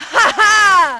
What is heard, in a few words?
haha; Splashdown; XBOX